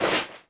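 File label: metal slide small; objects; Star Wars Obi-Wan; XBOX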